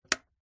household radiator heater portable switch click

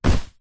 household small foam chair fabric sit down; zapsplat

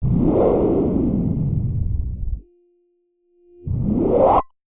StunGun; martyn stonehouse

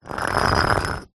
foley brick scrape hard ground

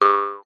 cartoon bounce jump spring single jaw harp

